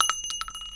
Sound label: Shell; Marisa Kirisame